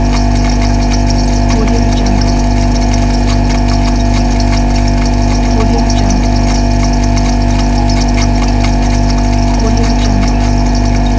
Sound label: refrigerator loop